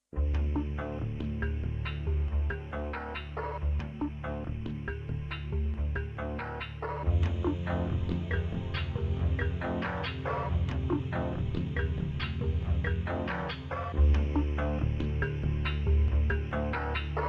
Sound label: Noise